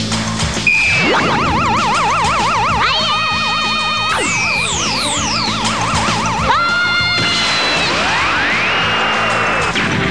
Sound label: fireball